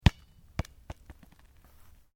Sport Soccer Bounce To Rest Concrete, Soccer ball sounds; Soccer (Sound Effects:Sports)